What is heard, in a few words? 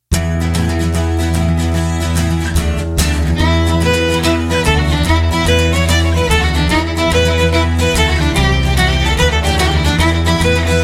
JIG; MORRISON'S JIG